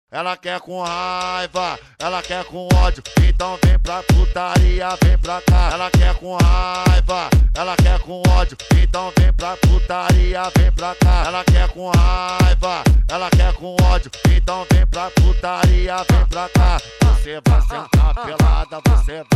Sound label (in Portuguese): berimbau raivoso